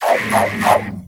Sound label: player eat player; JavaGameUebungen